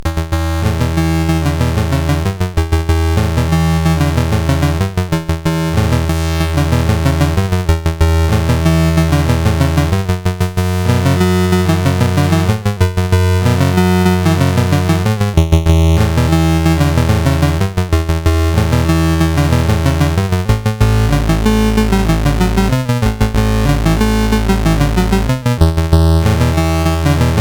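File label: Magicians Ball, MOS 8580 SID chip music, games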